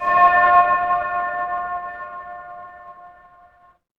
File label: instrument singing bowl